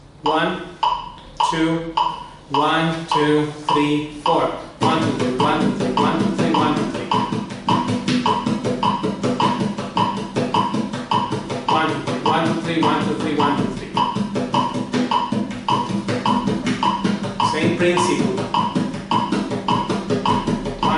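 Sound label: Rasgueos, flamenco guitar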